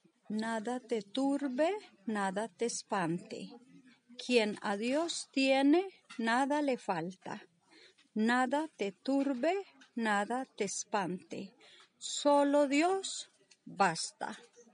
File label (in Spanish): taize; LISTEN TO THE PRONUNCIATION